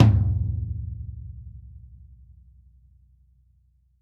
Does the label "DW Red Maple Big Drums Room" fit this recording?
Yes